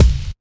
studio kick drum one shot b key, kick drum one shot tuned to the B note